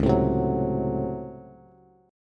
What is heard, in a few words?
Db Major 7th Suspended 4th Sharp 5th Db Bass; 9,12,10,11; strummed; chord shape